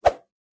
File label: Motion Swish